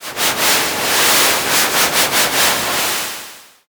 river

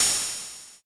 cymbal

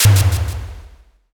pistol

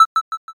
cursor

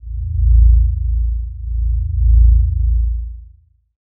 drone

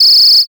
whistle long

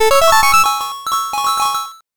jackpot

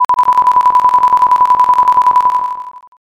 trainbell

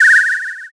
whistle short

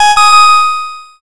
correct

